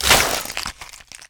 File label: att bite, sheep; zoochosis